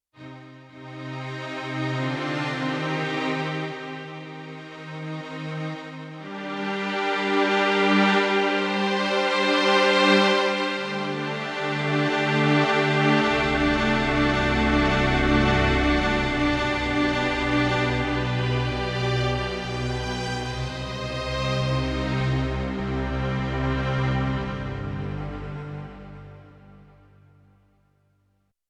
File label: String Attack, Yamaha TX16W; Orchestral Performance 1